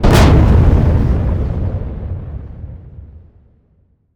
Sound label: Final blast sound; Blastmorph Pinball; xbox series xs